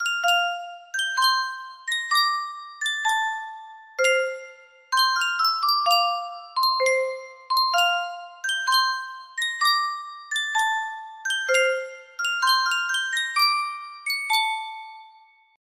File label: music box, music box melody; attack on titan